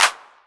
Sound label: Clap, Novation Drumstation; DrumstationV1